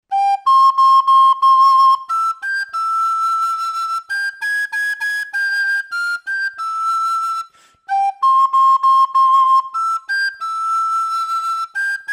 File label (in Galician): trad, Pito Galego